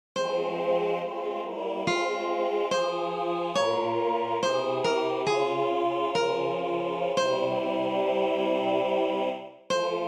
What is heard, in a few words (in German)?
Aus tiefer Not chor sop